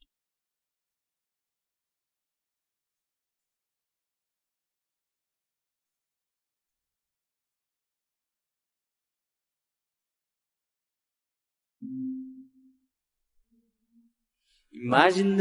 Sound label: vocals